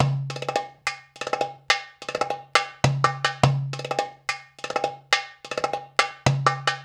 PERC LP, Arabian Traditions; USB Soundscan vol.43; AKAI

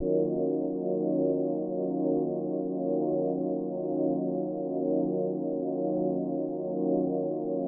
Pad Loop 125, Loungin House; Equipped Music

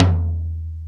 TOM, DrumBase5000; Crypton; CFZ KIT 2

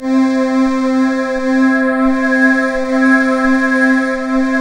SYN MORP, Roland; Sound & Vision - Gigapack II

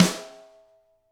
GRETSCH SNR rim; ILIO; Double Platinum Drums 1

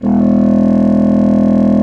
bassoon E1; C BASSOON; Xsample VOL-6

